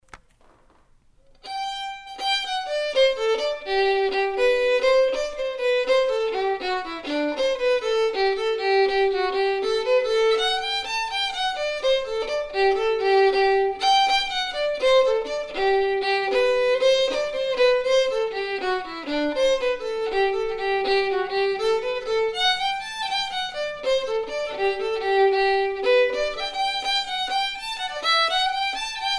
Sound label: Jig, fiddle; Coolea Jig